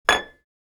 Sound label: Ceramic plate setting down on a table